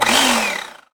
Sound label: e atk saw